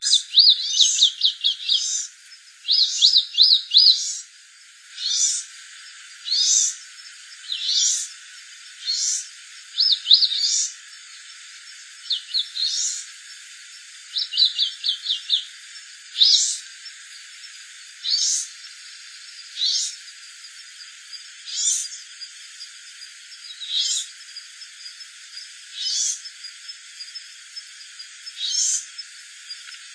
voz tacuarita azul, Polioptila dumicola

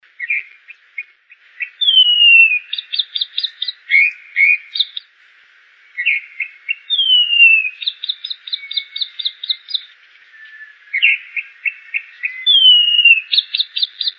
voz calandria grande, mimus saturninus

